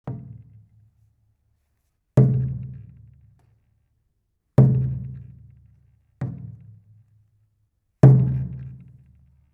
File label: taiko